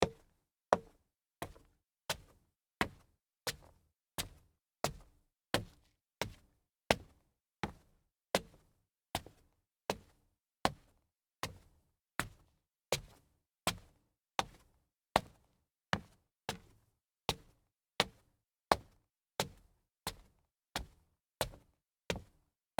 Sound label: sneaker stairs walk solid wood